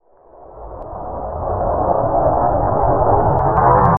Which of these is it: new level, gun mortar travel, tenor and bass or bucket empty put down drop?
gun mortar travel